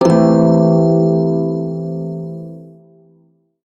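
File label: Prime Shawzin Pentatonic Major Chord, Music; Warframe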